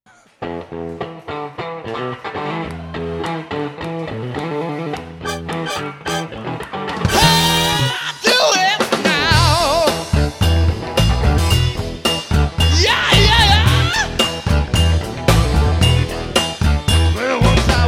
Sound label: Funk Intro